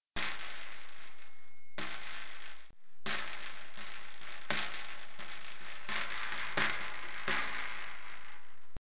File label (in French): son tambourin